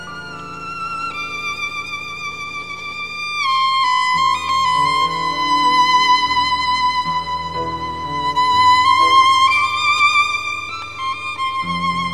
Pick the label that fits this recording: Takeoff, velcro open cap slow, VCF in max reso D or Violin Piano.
Violin Piano